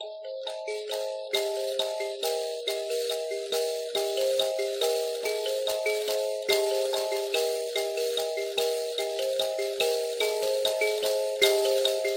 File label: Mbira Instrument